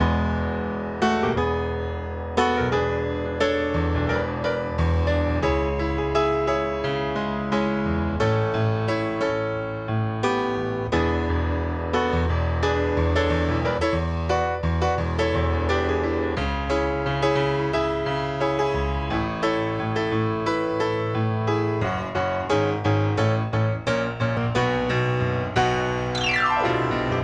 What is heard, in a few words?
Piano